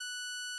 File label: PeakSlope F#6